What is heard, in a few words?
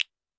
percussion, Roland TD-20